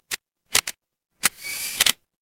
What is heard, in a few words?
office camera